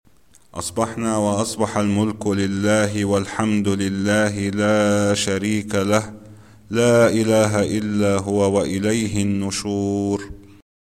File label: Zikr asbahna ve asbaha